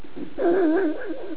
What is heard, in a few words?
laugh mono